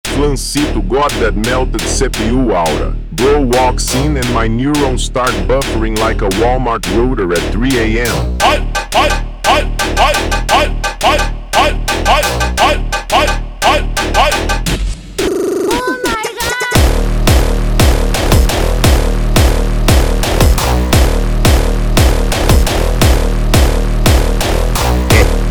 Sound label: Hardstyle mega Slowed